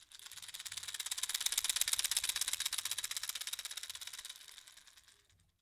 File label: DISCRETE DRUMS II